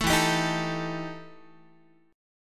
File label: E Minor Major 7th Double Flat 5th E Guitar; strummed; JGuitar